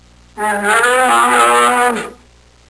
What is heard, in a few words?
bear